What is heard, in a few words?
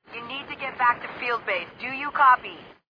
transition; eight below